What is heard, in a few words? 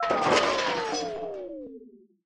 floyd die, monsters